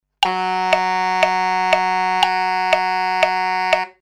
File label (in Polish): dziura eb1, saksofon